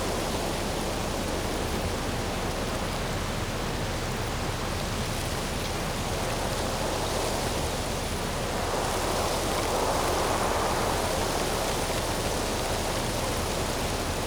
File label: messy wind close; WAR